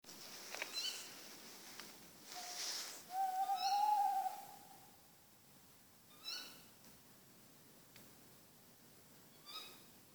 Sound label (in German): Waldkauz Strix aluco